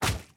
Звук удара карты об персонажа

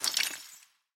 Звук использования кристаллов для моментального исследования

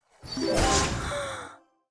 Звук комбинации карт

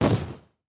Звук уничтожения карты

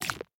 Звук при недостатке энергии

